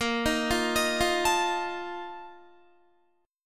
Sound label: A sharp Major 7th Suspended 4th, Guitar; arpeggiated; guitar chord